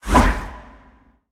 Swing; Smite; god Kumbhakarna

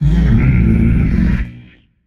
tongue, voicepack; smite; jorm

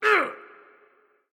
hitreact human male small

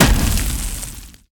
impact fire; smite; vulcan

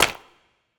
clap; smite; horus

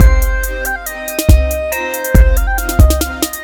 victory music; smite; kuzenbo

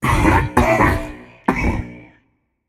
clear throat, voicepack; smite; jorm

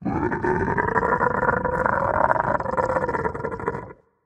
ward placed; Smite; bakasura

